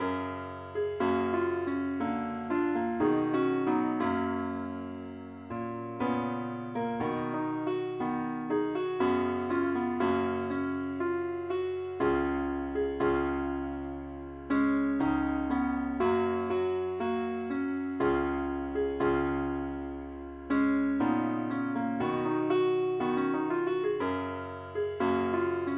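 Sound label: keyboard only key F sharp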